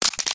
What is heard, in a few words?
MECHANICAL; PMSFX